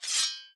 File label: sword draw